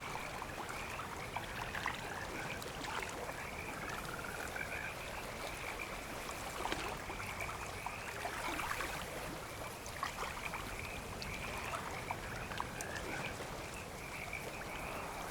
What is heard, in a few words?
Hut Amb; Doyen